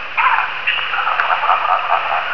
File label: whoop